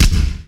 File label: shoot; Drunken Robot Pornography; Inago Rage